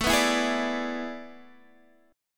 A Major 7th Suspended 2nd Suspended 4th, Guitar, DADGAD; chord